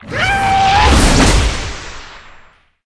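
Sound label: hexscyther attack, monster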